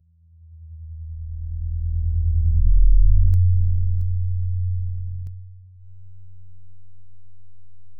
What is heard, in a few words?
deep bass rumble at 200hz